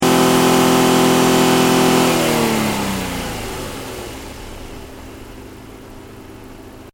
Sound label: CAR NISSAN 350Z ENGINE BAY 5000RPM TO IDLE, manual sportscar; Cars; Vehicles